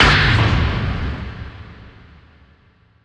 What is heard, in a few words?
Pressure; In the Line of Duty Firefighter